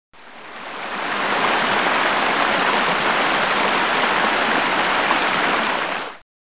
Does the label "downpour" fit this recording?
Yes